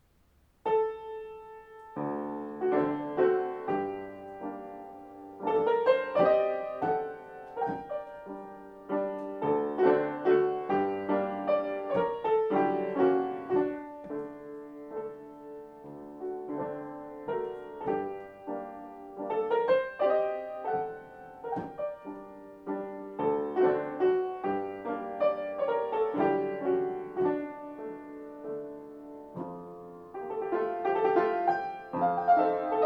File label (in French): Chopin Mazurka Sol Mineur; ConcertToulouse